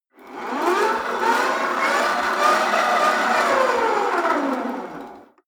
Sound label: rope trainer pull sequence fast; sport freizeit; fitnessstudio